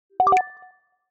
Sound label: Nokia Message